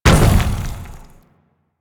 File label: Bowl Land; DE; Warframe; Warframes; Grendel